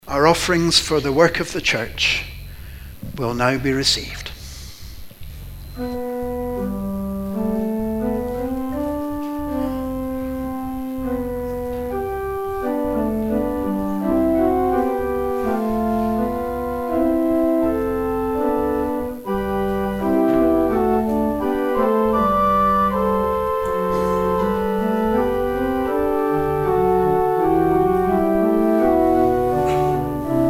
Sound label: organ interlude